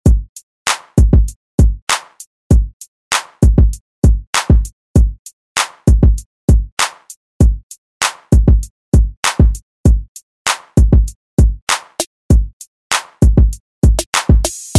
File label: R&B; Deejay Hit; Hit RB Redrum